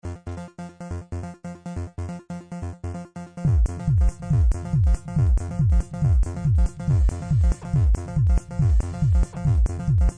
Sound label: Bubble Disco, dance